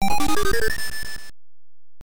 Use Spell; Willow; NES

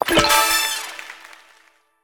Win

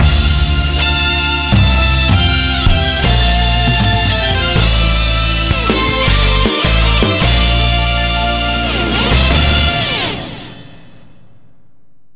fanfare

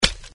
Player Hurt Bullet; Acid Rising

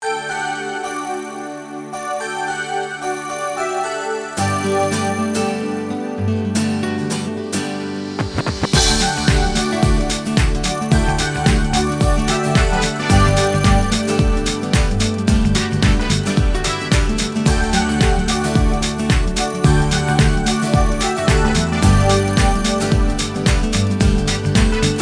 Marching Puzzle; barbie horse adventures mystery ride

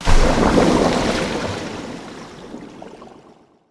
Bubble; Yu-Gi-Oh! Master Duel

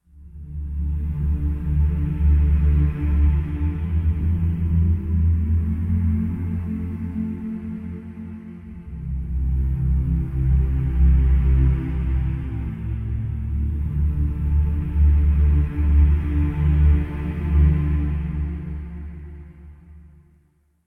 Defeat

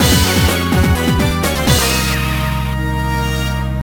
Jingle OVER; Alex Rider Stormbreaker

